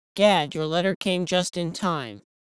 Contralto

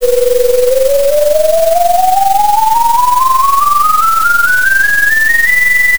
noisy Wave 2 Semi

